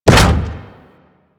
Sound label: Arachnoid Camper Step Default; Warframe; Corpus